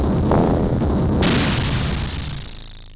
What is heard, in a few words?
base explode